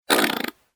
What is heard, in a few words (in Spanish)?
pedo